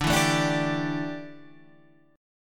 D Suspended 2nd Suspended 4th D, down, Guitar Standard; strummed